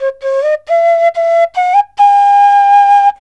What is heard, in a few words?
SERULING, Heart of Asia